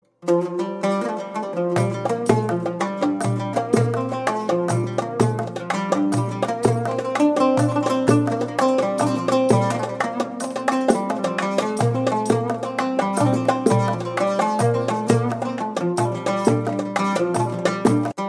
Yemeni ringtone

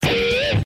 bleep

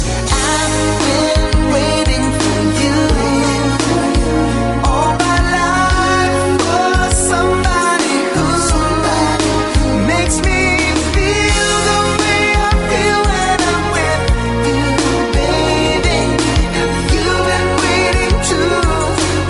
Romantic Love

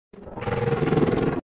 small roar; Dragonine

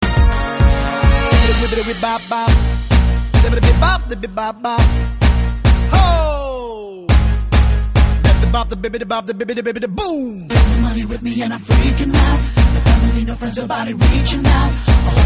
Sms Tone

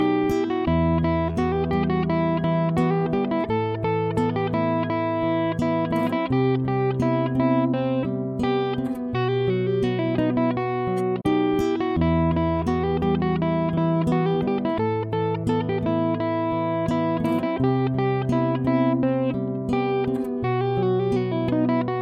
Instrumental

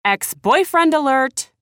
Boy Friend Alert

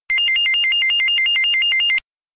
Simple Ring

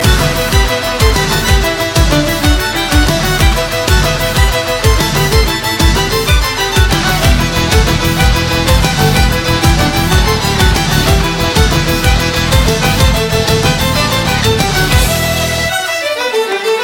Irish Dancer

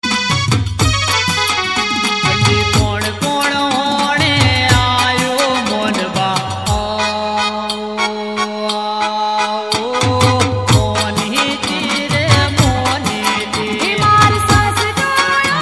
Navratri Garba

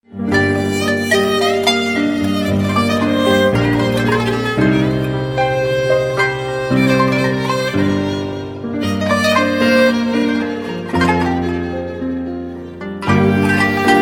Turkish Melodi

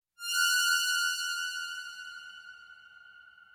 Trap

